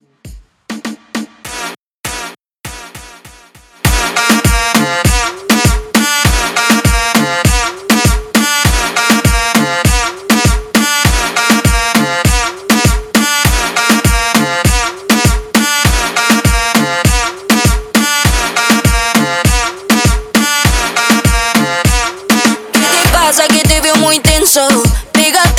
Moombah Mixshow Extended Without Hype 100 BPM

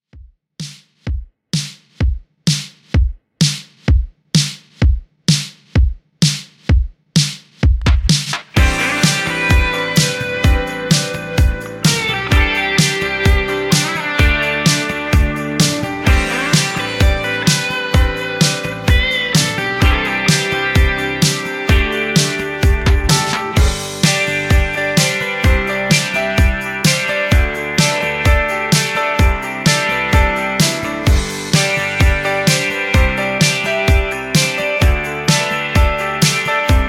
Classic Redrum 128Bpm; djyan

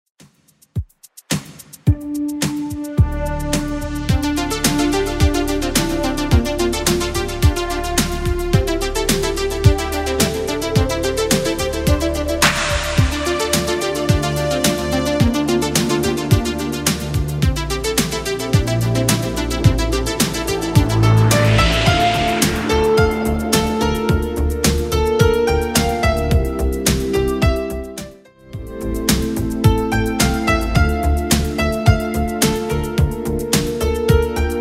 80s Redrum, 108 bpm

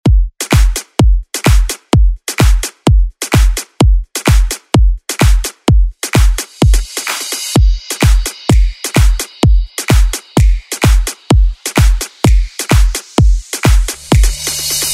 Bounce; Green Bull

